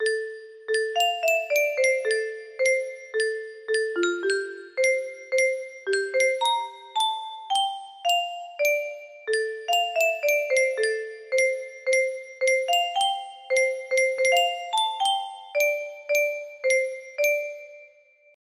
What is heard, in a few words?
melody, music box